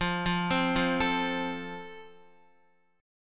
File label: F Major, Guitar; arpeggiated